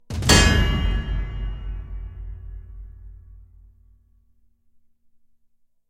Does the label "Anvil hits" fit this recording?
Yes